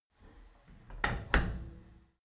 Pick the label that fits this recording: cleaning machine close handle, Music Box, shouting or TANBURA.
cleaning machine close handle